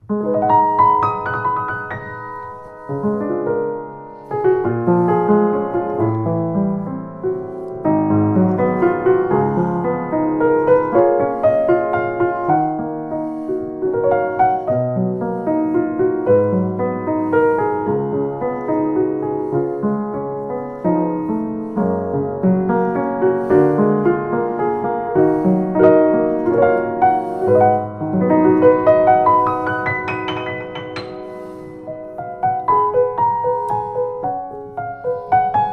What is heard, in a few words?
Kawai K15e, piano